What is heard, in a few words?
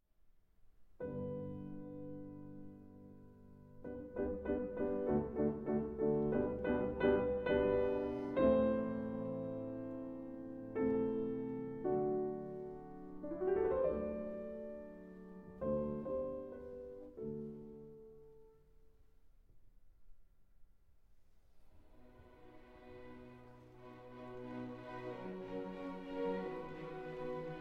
Piano Concerto in G Major, Allegro Moderato